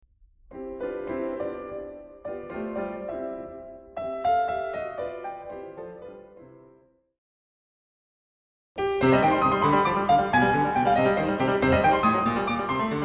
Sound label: Happy Sad Bagatelle; Beethoven